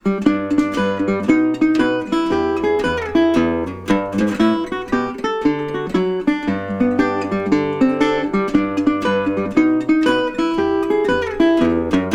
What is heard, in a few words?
Harmonia Rag